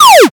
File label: laser